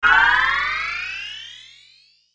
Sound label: one Up; JumpinJack